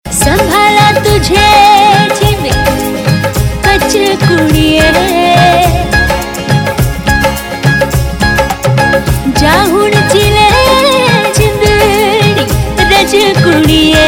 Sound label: Female Ringtone; Bollywood